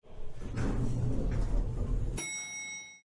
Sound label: elevator